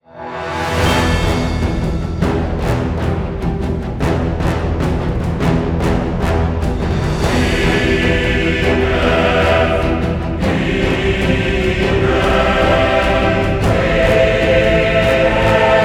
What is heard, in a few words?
Hollywood Choirs Trailer